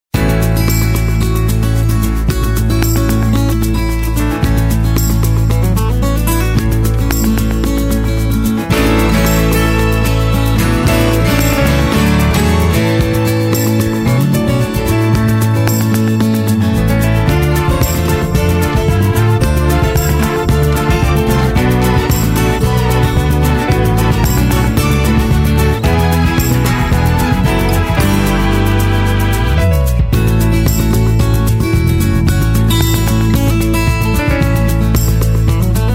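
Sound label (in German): Halbplayback Ouvertüre; Tabaluga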